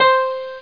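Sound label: grand piano C4; Alone In The Dark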